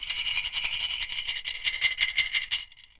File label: cocktail shaker rattle rh